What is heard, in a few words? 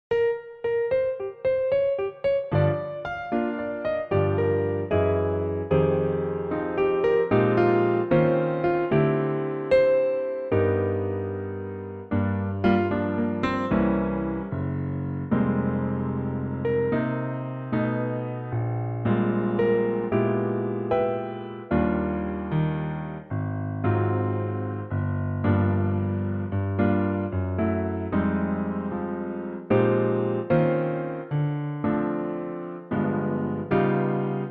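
piano, backing track; key - Eb - vocal range - Bb to F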